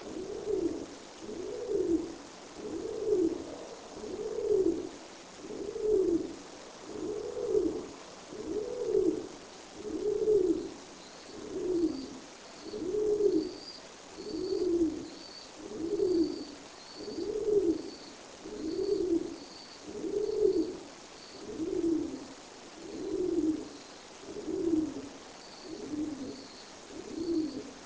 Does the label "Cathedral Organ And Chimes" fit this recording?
No